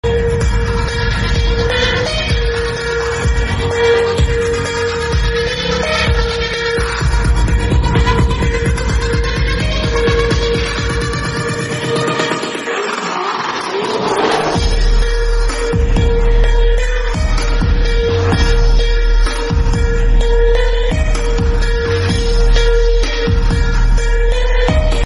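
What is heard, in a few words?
beats background music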